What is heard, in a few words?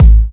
smooth bottom end bass drum sample a key; kick